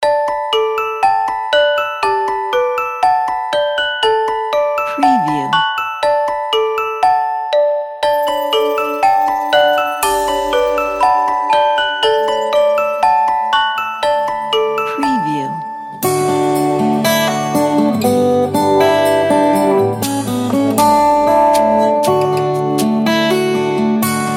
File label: magic music box